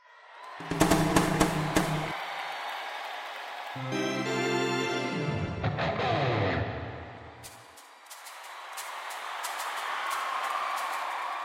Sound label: MUSIC; cmedia; Albatron